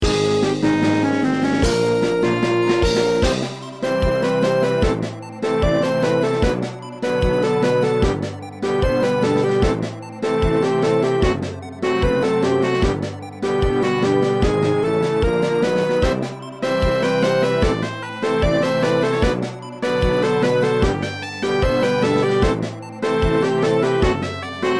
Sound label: Battle Victory; Elite Four